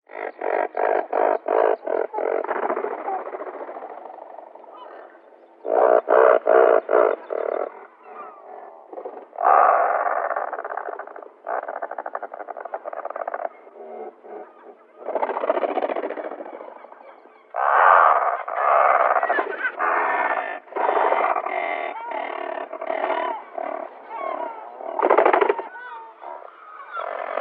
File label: Razorbill